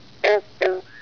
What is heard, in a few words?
green frog call; texas nature trackers; amphibian watch